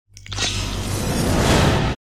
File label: Scary sting